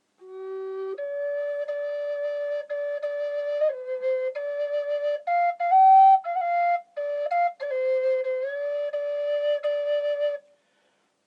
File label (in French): double sol 432 hz